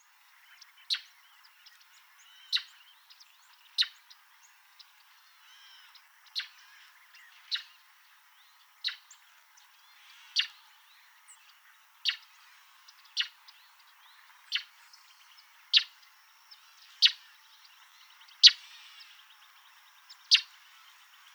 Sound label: Northern Mockingbird Mimus polyglottos